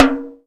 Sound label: Tom High